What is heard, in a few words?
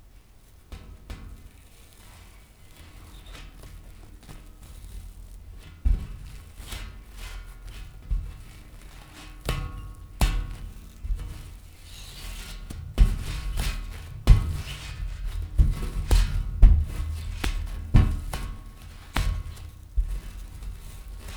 paint stretch